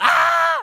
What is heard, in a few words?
Male Pain